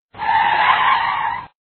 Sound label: brake, cars